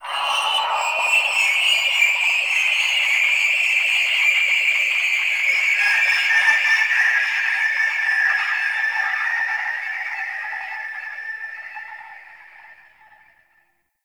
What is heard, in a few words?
Possessed Texture, Ambience; Warframe; Shadowgrapher